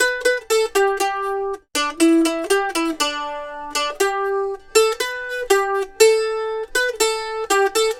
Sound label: Mandolin Lead; Appalachian; Continental Drift Aftershocks